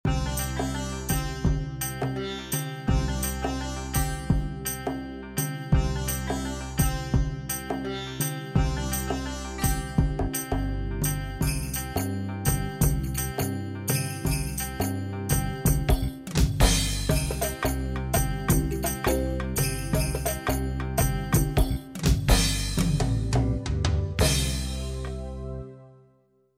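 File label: Shalimar C major, India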